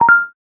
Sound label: Voice start; Samsung Galaxy Note 3